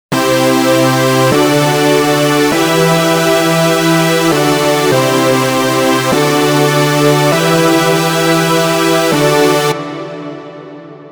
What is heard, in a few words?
Chords